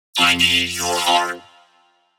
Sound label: Vocal 140 (F); One-Shots; Future Vocals; I Need Your Heart; Ghosthack; Color Bass Essentials